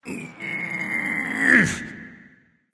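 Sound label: nonverbal grunt lift; smite; voicepack; ullr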